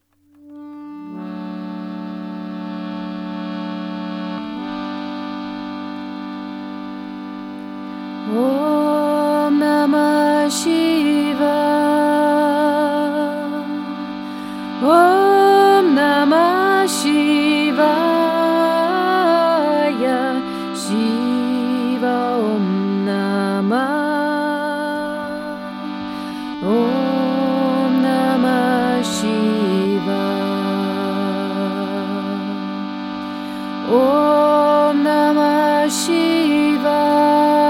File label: Om Namah Shivaya